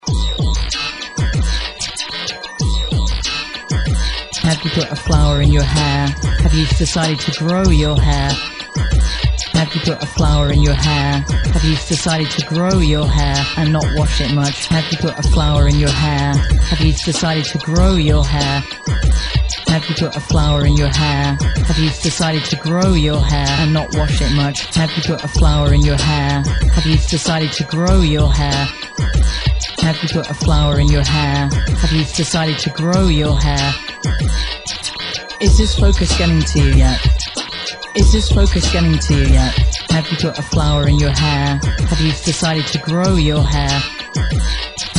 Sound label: rap